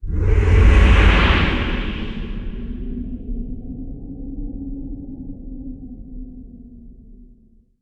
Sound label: ui results Defeat; Dawn of Titans; Android iOS mobile gamerip 2016